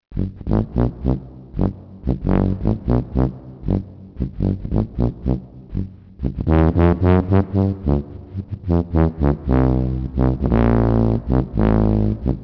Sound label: tuba